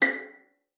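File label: violin pizzicato B5; Xsample VOL-5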